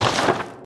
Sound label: Backpack Open; Custom UI Sounds; Bloodborne